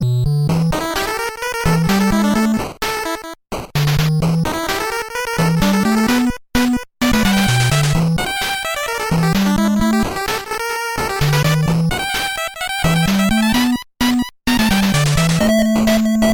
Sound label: NES